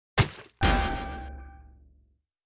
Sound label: stab; omori